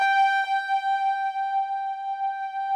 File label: PAD SOFTS; Pro-Rec - Synthsonic